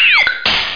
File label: frog; skunny